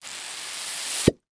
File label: Liquid Water Plunger Wet Pulling From Cement Suction